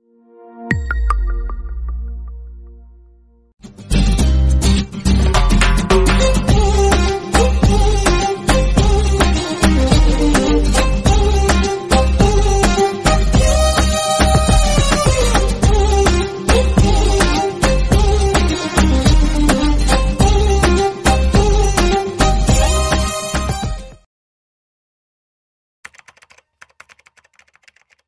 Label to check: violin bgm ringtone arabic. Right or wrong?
Right